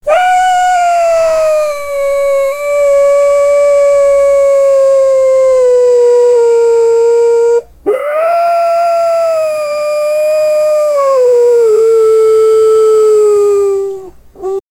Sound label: werewolf sounds, moonlit